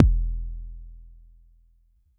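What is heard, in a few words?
Long bass drum, Jomox Airbase99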